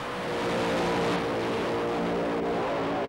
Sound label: Runway